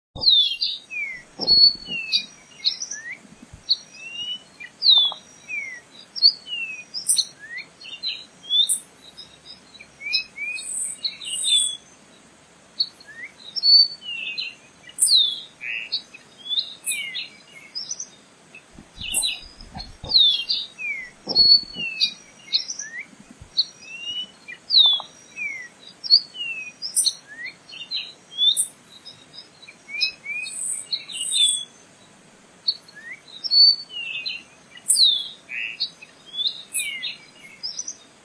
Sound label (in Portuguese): canto do rouxinol do rio negro